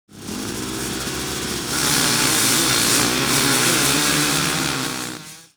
Start, UI

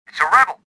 anger; worker3

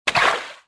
water walk, footsteps

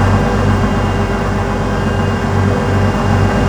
tank idle; Soldier Of Fortune II Double Helix; XBOX; ambience

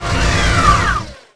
mech arm; Tony Hawk's Project 8; XBOX

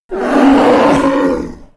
Black Bear Vocal; Animals; XBOX; BassProShopsTrophyHunter2007

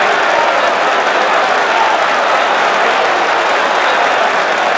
Small Crowd Cheer; David Beckham Soccer; XBOX

